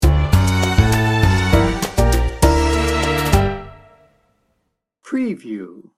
rhumba romance sting bumper

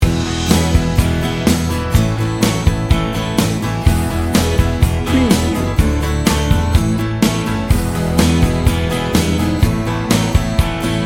loop

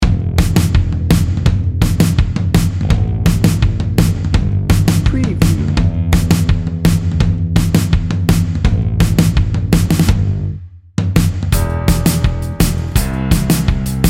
swamp monster

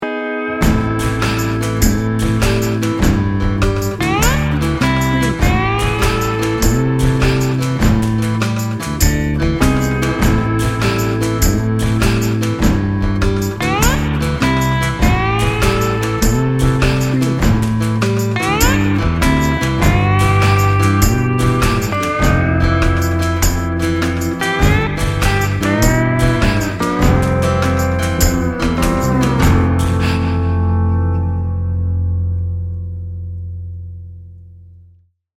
river snake

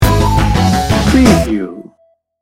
cartoon critters sting bumper

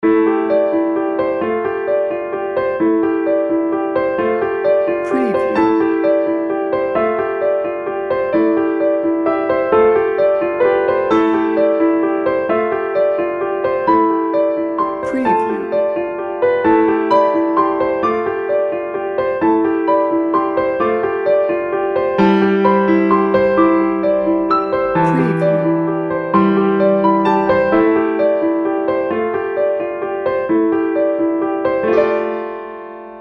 dreamy elegance

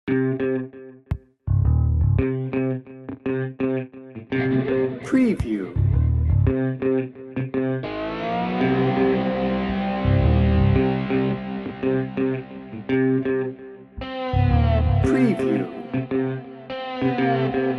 swampy tension no drums

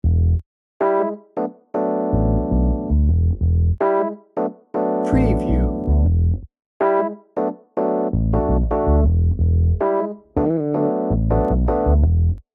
jazzy feet bed no drums

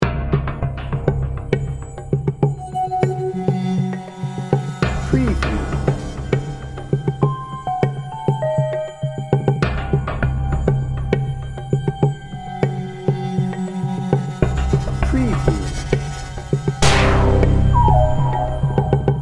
evil intention loop

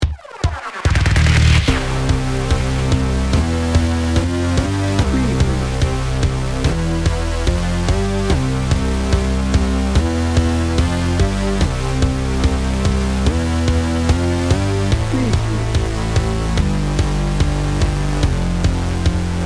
science and technology dnb

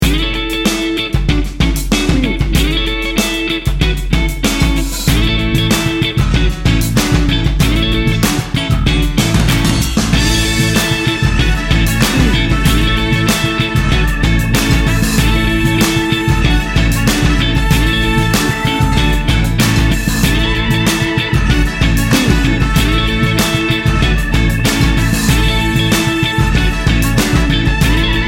memphis soul

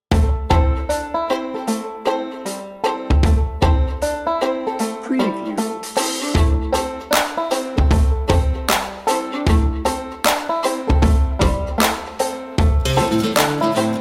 redneck hip hop bed

